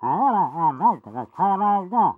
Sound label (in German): Pyro taunts, de